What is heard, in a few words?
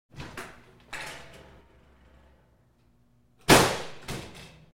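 Door Screen Open Slam with Rattle; Doors, Squeaks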